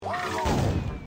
fuego pum

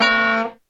golpeee

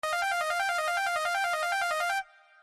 bocina coches chocones

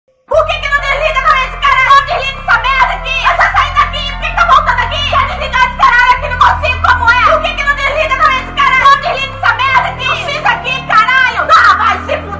grito; tulla

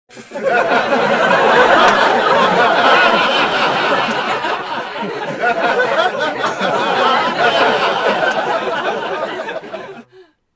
risas

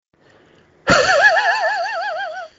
risa perro; patan

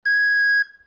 flauta

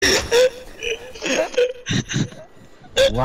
riendo; fenrir

